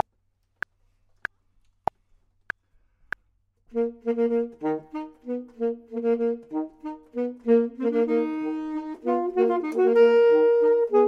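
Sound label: both, Saxophone and Clarinet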